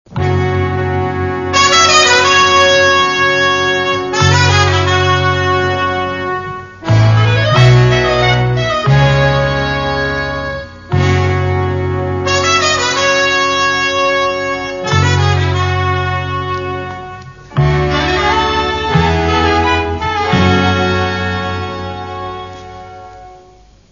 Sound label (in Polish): muzyczka